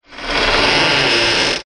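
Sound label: Door Creaks Low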